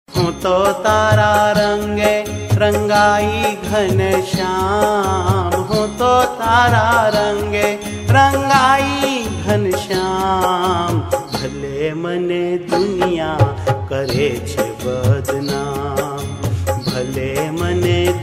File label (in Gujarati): Hu To Tara Range